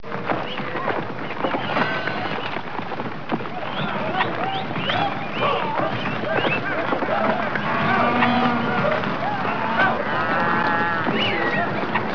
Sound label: herd, animal; wanted